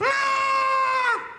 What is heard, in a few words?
ahhhhhhhh